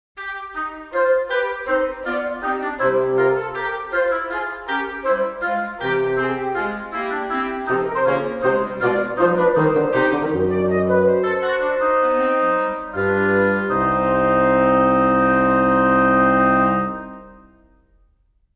flute, clarinet, oboe, and bassoon blending together; sampled woodwind instruments; Berlin Woodwinds; Orchestral Tools; From Prelude and Fugue in C minor, BWV 871 by J.S. Bach (1738)